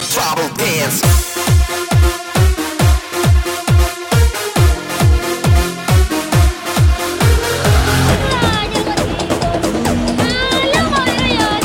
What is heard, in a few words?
Tribal Dance